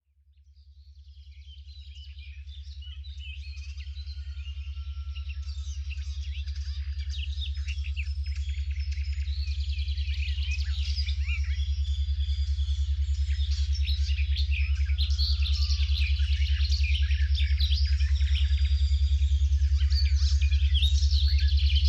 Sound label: Forest Sounds alpha waves